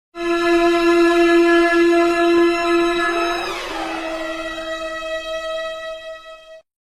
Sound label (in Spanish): Suspenso Violin; Meme Efecto Sonido